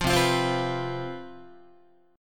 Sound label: C Major 7th Suspended 4th, C, down, Guitar; strummed; CM7sus4; 8,8,8,10,9,10